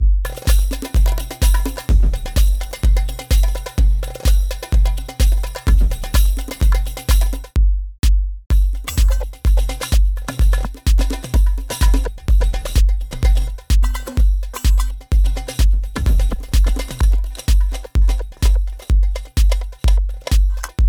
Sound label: delay perc Mod glitch